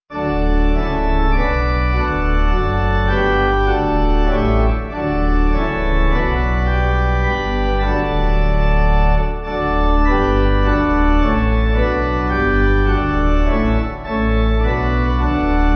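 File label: Pipe Organ, Music Backing Files for Churches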